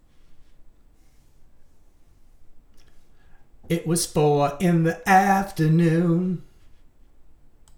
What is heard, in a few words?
vocals